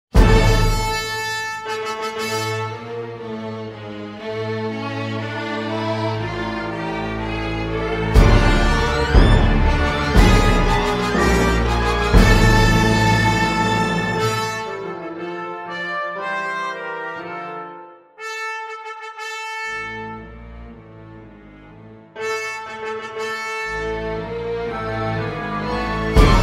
Fanfare

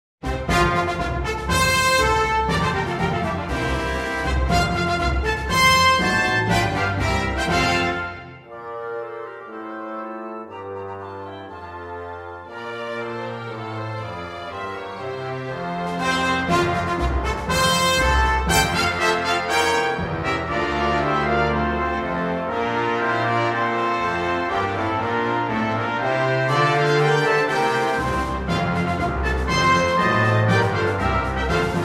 Fanfare

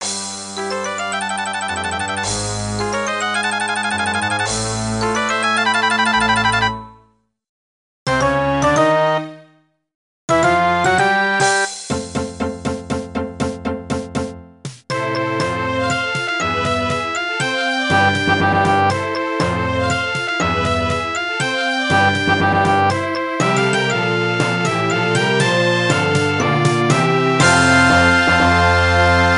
Full Orchestra; Score

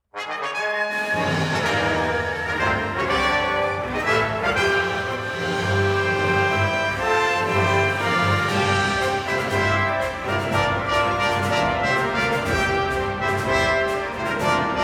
fanfares coronation fanfare

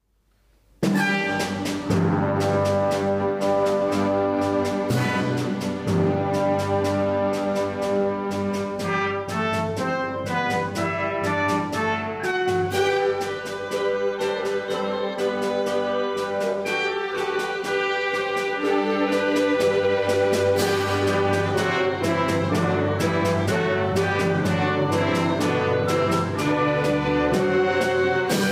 Fanfare

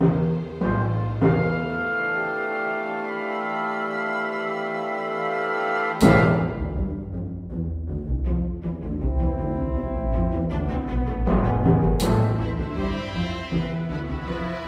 Suspense Fanfare

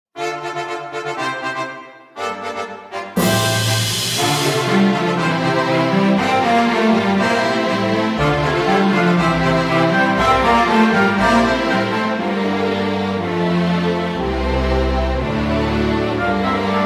Fanfare Grandioso

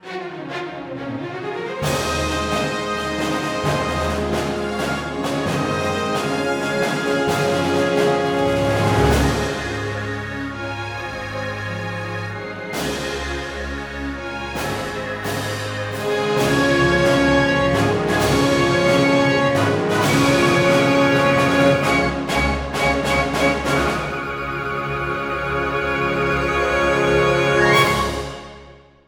Fanfare

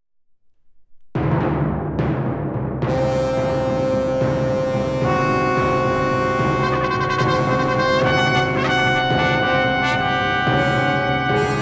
STEREO FULL

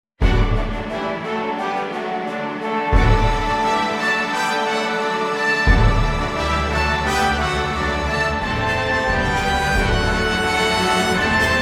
Fanfare

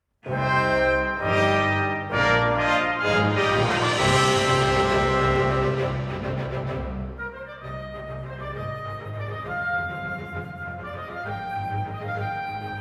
fanfares fanfare

